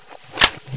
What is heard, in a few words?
desert eagle to ironsights